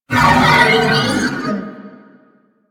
Horse Vocal Screech Jump; Warframe; Vehicles; Duviri

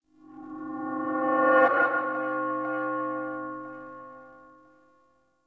Bell Texture, Crypt Ambience; One Offs; Champion Crypt

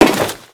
Crewman Step Default, Footsteps; Warframe; Corpus

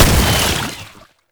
Infested Spore Pistol Shot; Weapons

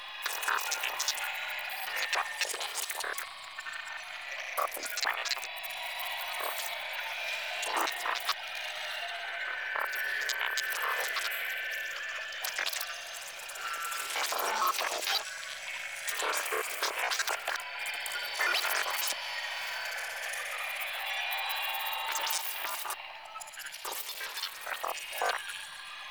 Sacrifice Vitruvian Slide Ambient Loop; Warframe; Quests; Chamber

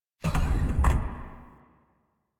Elevator Door Open; Warframe; Caliber Chicks minigame; environment

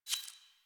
UI Default Theme Squad Member Joined; Warframe

